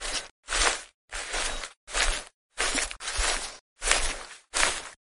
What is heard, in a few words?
grass, footsteps